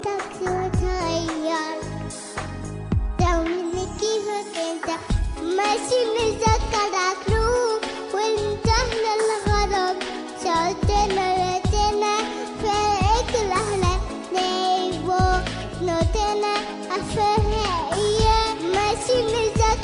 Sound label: sweet baby sing